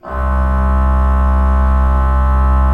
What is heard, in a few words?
HGRP C1, Cathedral Organ, HOLZGEDACKT; Propeller Island